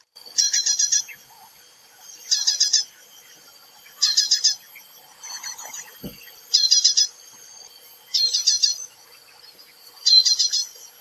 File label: Ashy Tailorbird male calls, Kledang Sayong Forest Reserve; Ipoh Perak Malaysia